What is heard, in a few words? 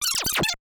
Chipmunk Scratch Scared